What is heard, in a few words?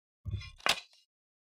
Ringing Katana Tsuba